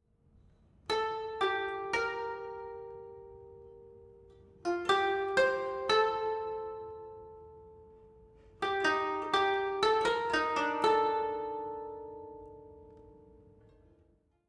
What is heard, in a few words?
Savannah Music